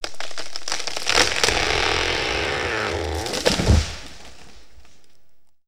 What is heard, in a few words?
Wood Tree Crack & Fall; Impacts & Destruction Sweeteners; Sony Pictures Sound Effects Series Volumes 1-10; Sony Creative Software